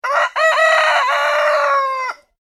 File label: animals cockrel crow, Cockerel/Rooster Crow; ' Cock - A - Doodle - Doo '; Birds